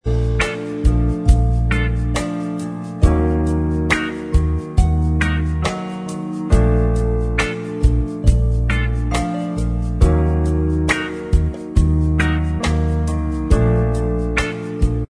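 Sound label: instrumental track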